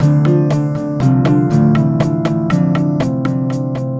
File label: tribal groove